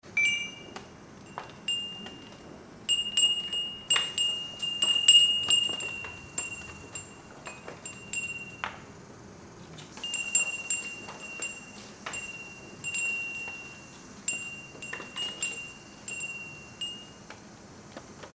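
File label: furin, wind chime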